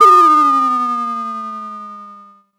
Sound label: Sound of a doorbell